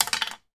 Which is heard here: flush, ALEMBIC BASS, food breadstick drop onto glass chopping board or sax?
food breadstick drop onto glass chopping board